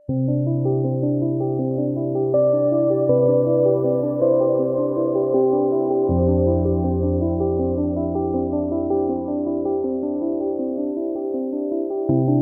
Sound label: bg music